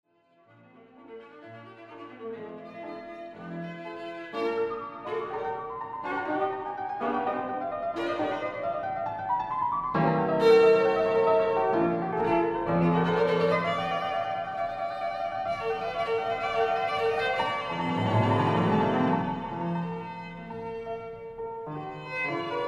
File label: Viola Sonata Allegro Moderato